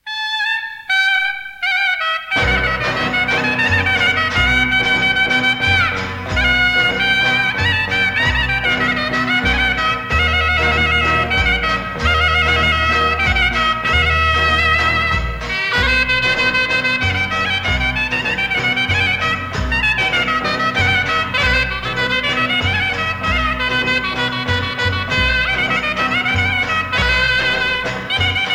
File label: Tsamikos